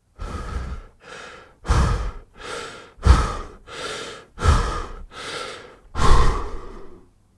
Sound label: male heavy breathing